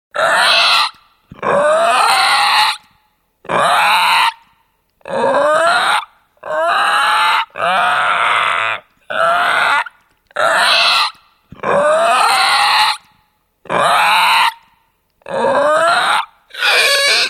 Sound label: Croaking crying sounds of a baby Dinosaur or similar reptilian alien creature.; Dinosaurs; Animals